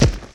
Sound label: c4 plant; weapons; suicidebomb; M9K Specialties